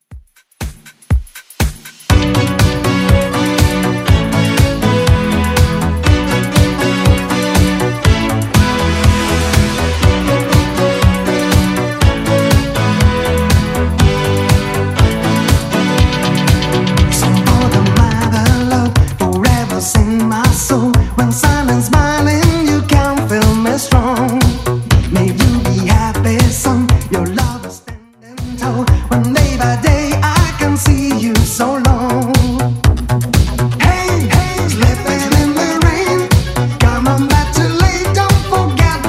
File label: 80s Redrum 121bpm